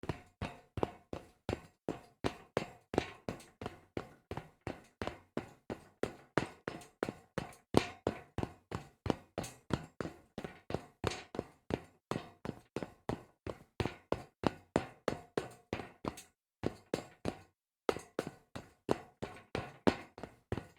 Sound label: walking fast on metal grate